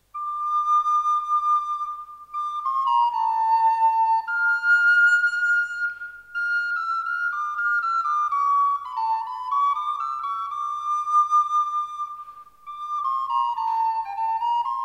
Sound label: Soprano, recorders; Haka